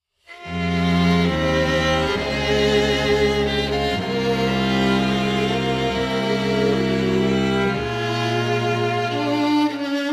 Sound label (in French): quatuor sax, pavane